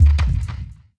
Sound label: One Shot, Hits